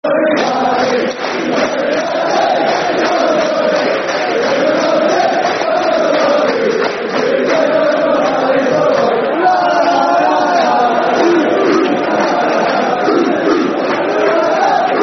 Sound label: You Are My Palace, Football Chants (Soccer Songs); Crystal Palace Cpfc